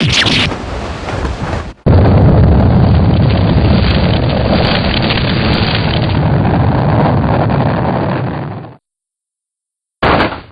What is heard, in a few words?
breakdown; alba